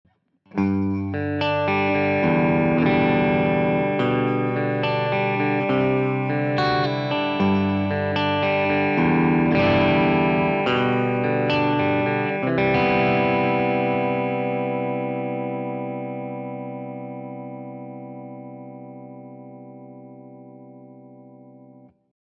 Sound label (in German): Schecter SLS Elite PT Beide Voice 2 Neck Voice 1 Bridge